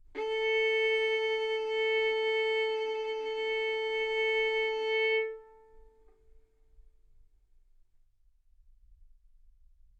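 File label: Violin arco mf A string A4 B4 stereo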